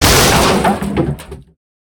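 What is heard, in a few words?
real undead warrior death, monster; thedead3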